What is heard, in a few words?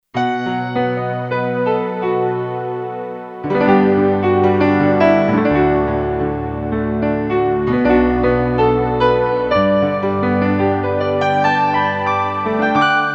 E Grand & Pad, Kurzweil K2500X; Factory Programs